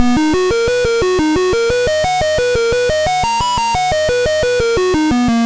Apple II Synth, Chiptune

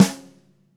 DW Green Maple Snare Room; Sonic Foundry (Sony Creative Software); Vital Drums

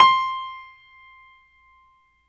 PNO Grand Pno; Club-50 - Foundations Roland

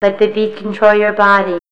VOCALS, House

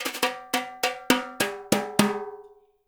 BRUSH TIMB; Spectrasonics; Liquid Grooves 1 & 2

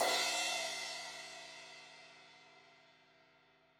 Crash 16in edge, Cymbals; Deeply Sampled Drums

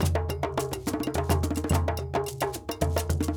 Djoun, World Percussion; Djembe, Donno, Bell, Shaker

